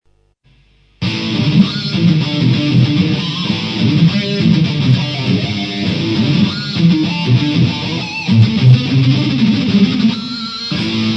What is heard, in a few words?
rythm and harmonics, Travail des harmoniques; Riff @ Harmonics